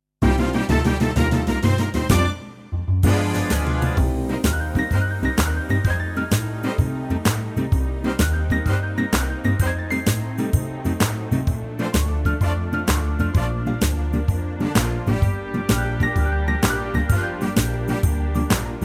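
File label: no backing vocals no solo; key - Bb - vocal range - F to D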